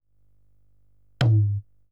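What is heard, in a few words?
percussion, house, 125, drum; eJay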